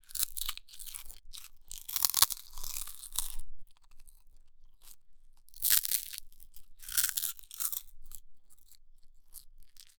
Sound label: ultra close macro foley, insects